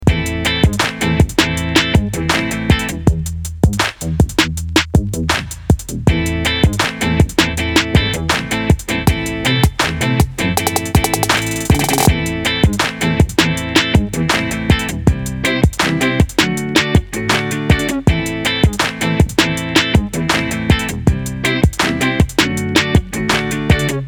Desert Hip Rock

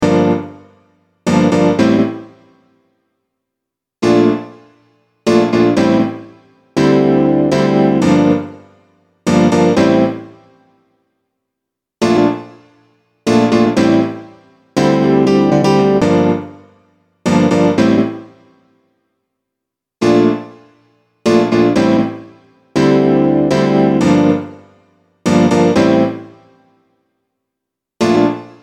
Funk

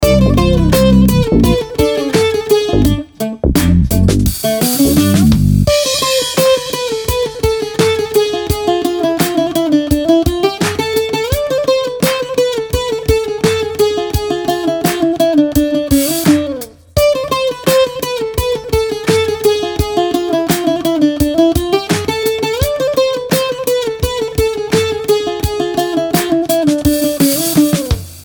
Ethnic World

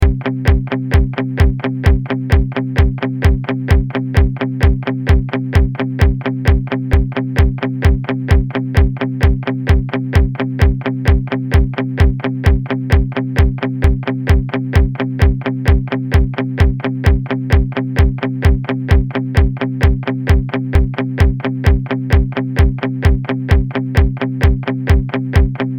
Blues

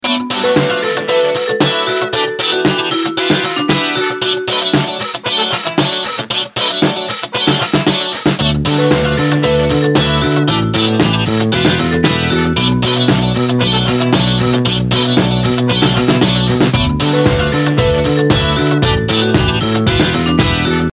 Disco

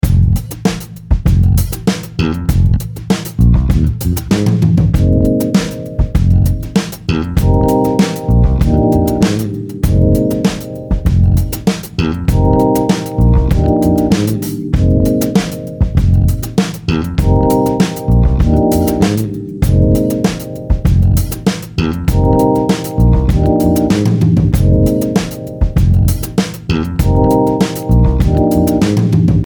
Cuban Soul

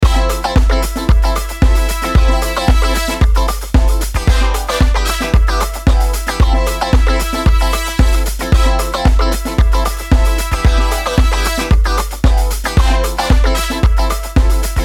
Dreamy groove

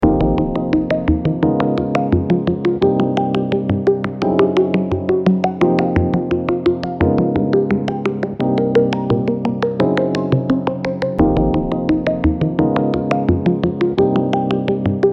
Jazz Dubstep

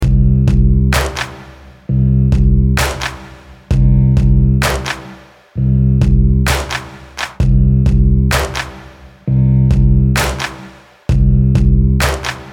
Oriental Funk

